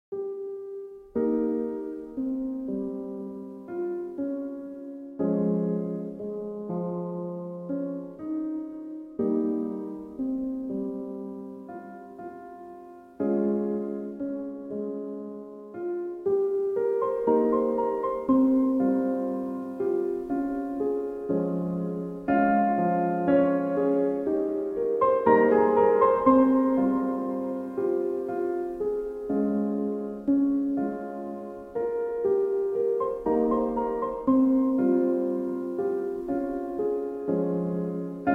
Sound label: Melodic Midnight Waltz